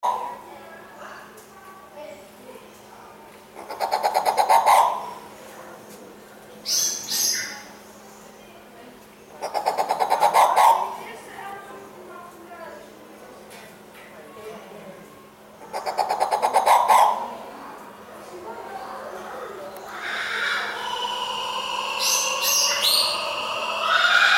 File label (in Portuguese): Canto do turaco violeta